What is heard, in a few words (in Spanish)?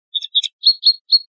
pinzonada, pardillo